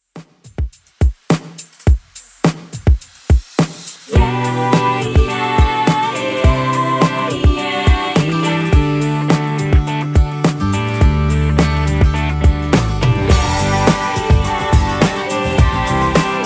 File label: 90s Redrum 105 bpm